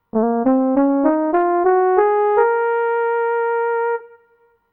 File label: trombone short